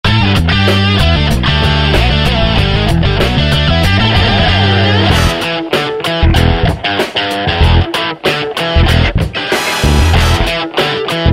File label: Overdrive, Ringtones; Samsung GT-S8600 Wave III